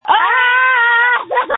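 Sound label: Scream, phone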